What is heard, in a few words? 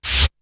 Reverse snare, Drums; ZERO G - Datafile DISC 3